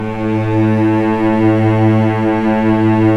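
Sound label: strings, mf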